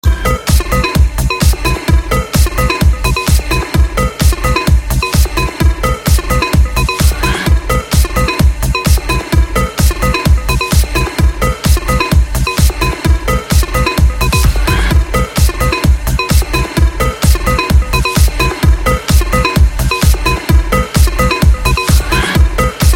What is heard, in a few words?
edm tone; iphone x